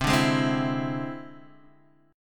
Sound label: E Augmented 7th C